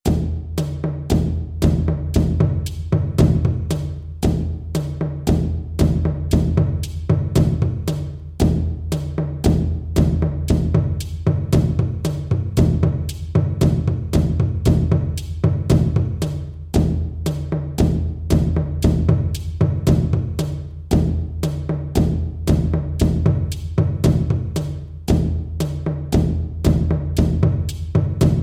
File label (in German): Stammesrhythmen